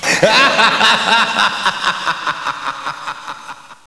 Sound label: MAN LAUGH